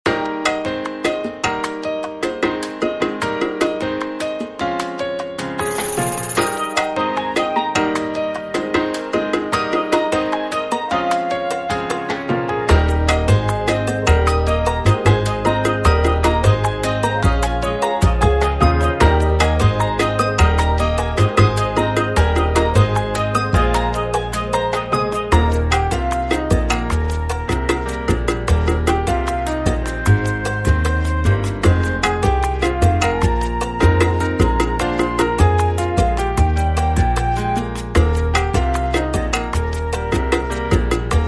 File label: Jingle Bells